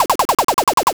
machinegun

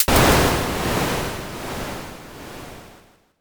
thunder

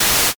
mosaic

